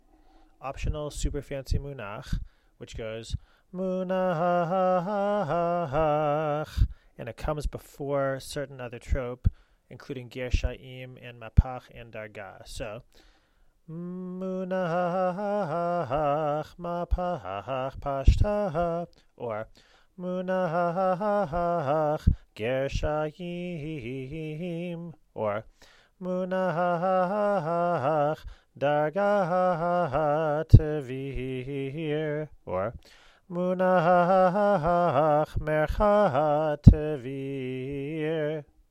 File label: superfancy munach